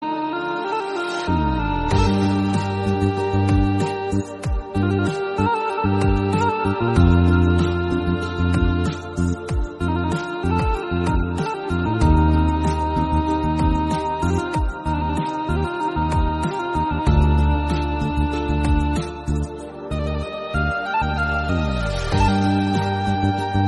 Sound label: turkish sad flute instrumental